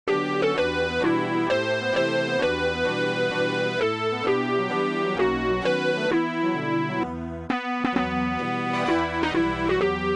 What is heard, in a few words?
synthe